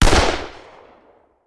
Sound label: Hand Gun; Sound Ideas; Guns